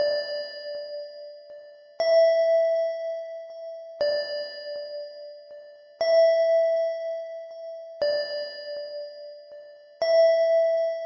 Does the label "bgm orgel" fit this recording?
Yes